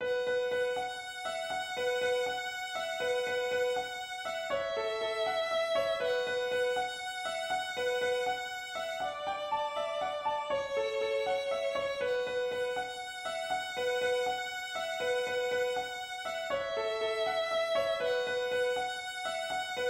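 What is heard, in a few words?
piano violin; Paddys Leather Breeches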